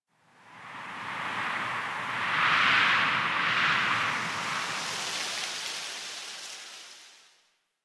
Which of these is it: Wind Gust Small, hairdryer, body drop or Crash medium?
Wind Gust Small